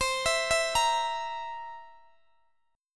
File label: C 7th C down Guitar Open D